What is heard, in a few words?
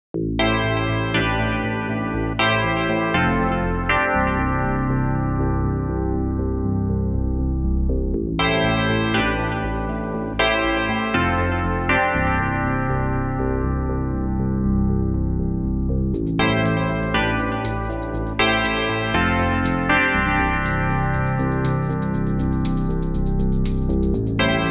EL GRAND 2; DEXED